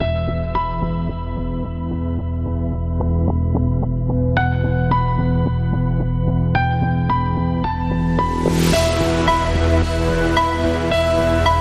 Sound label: No Perc